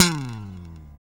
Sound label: BS, E.Bass FX